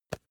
magazine tap

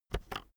keyboard external enter press fast

